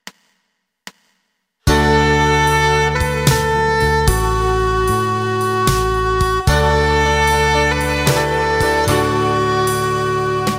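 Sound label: Silent Night Soprano Sax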